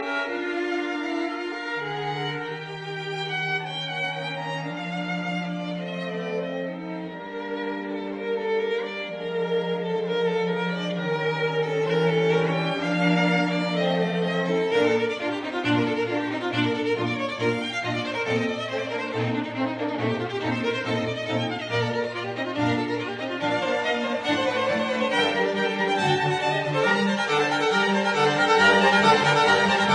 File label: String Quartet in B flat major, Allegro; Haydn; No 63; Op 76 No 4; Sunrise